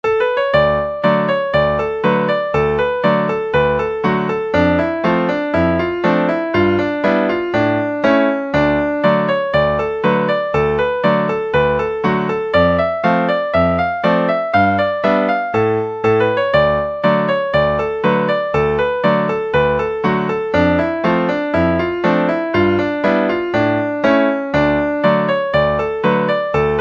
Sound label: ragtime reel